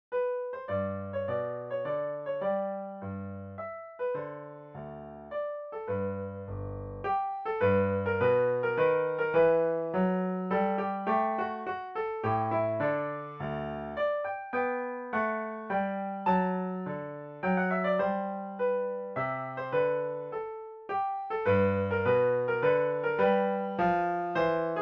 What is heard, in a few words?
piano